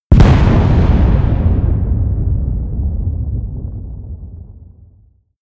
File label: big explosion